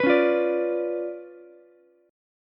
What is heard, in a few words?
C sharp 7th Flat 9th, Ukulele, C6; strummed; chord; 16,13,13,17